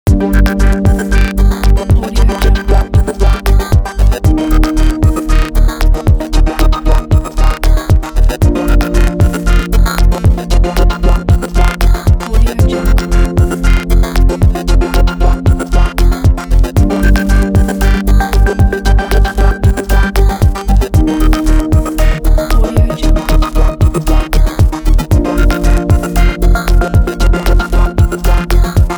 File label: abstract glitch